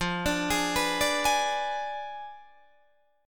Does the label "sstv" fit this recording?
No